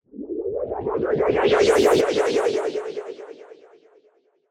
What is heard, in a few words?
Quirky Charge Up; soundscrate